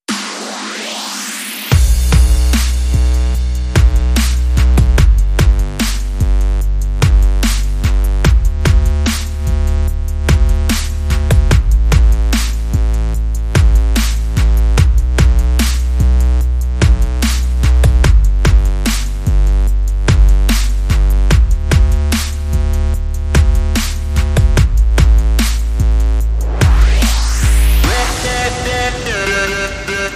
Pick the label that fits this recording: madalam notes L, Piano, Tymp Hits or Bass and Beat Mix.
Bass and Beat Mix